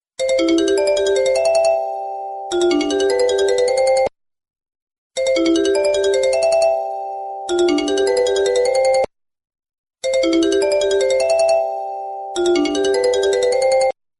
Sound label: musical tone ringtone